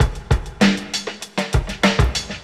Break; The Stubblefield

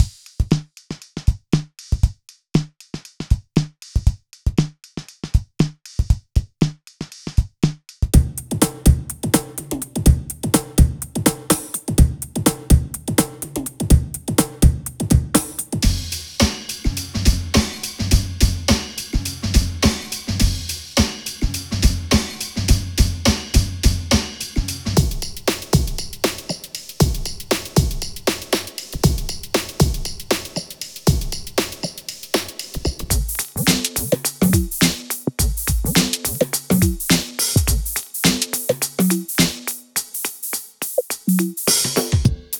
Drumloops; MixterPlus

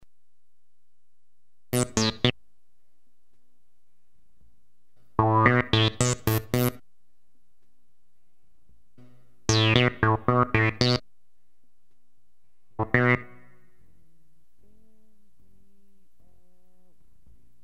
synth

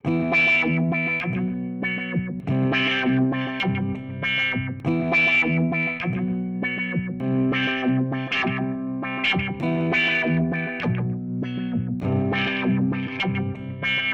AutoWah main chord prog

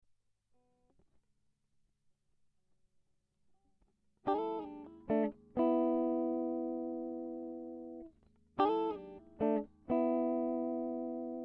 Jazzee Moteef

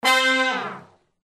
brass loop

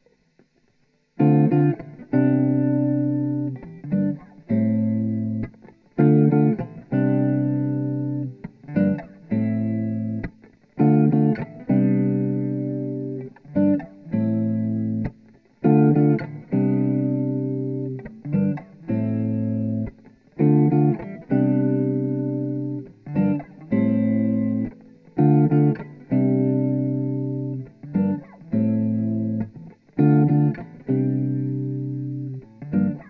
Dm100 Jazzy Riff

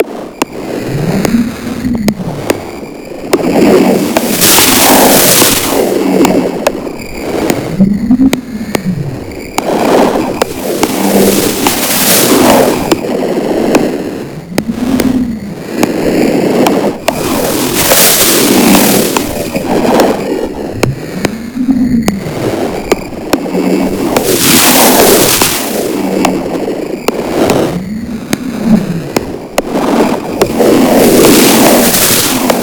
Cm72 Zone Ambience

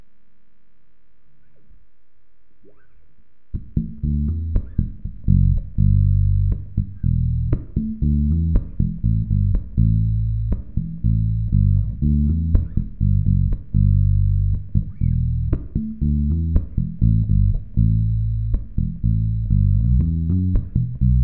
Acoustic Jam in E Minor

